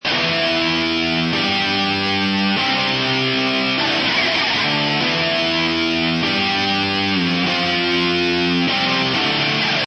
Electric Guitar Chords2 Loop Em 98Bpm, Electric Guitar Loop In E Minor At 98Bpm; Guitar Loops